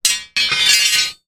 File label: impact metal rod hit ground hard; zapsplat